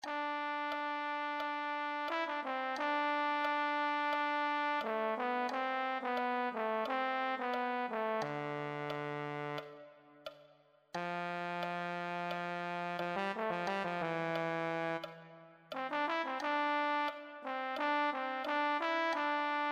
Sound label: euphonium